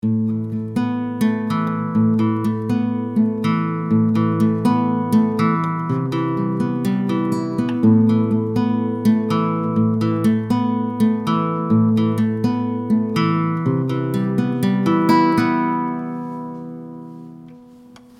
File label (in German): Gitarrenlick; 96k 24bit